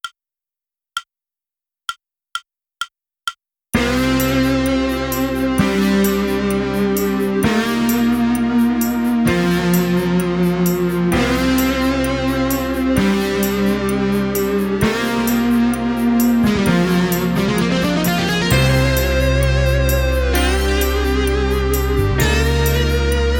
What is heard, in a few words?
drumless